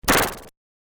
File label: Laser Ripple Gun Short Blast, science fiction sound effect; Andrew Fleming